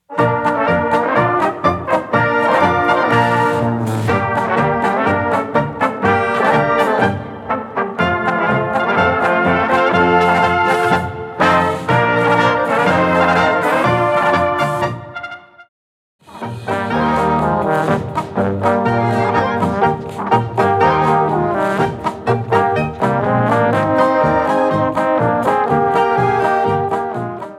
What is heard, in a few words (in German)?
Polka